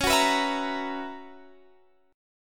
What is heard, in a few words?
B Major 7th Suspended 2nd Suspended 4th Db